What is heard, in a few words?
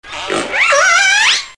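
ZOO SNORT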